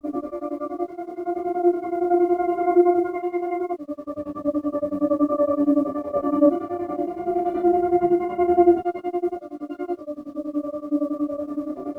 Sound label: 160 synth smooth pad phase shift Gmin